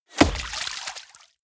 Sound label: bullet small impact water